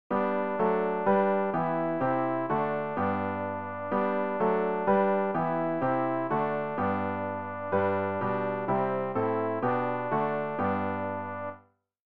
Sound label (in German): alt, Übehilfen für das Erlernen von Liedern; ref gesangbuch; rg 199 herr erbarm dich unser leipzig schaffhausen